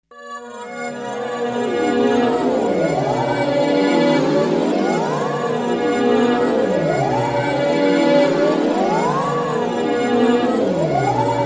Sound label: synth Detuned Delays